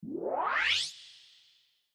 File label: Whooaaaaa